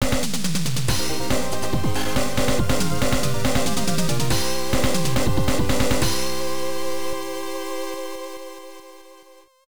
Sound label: Game clear; rusty pc-9801 opna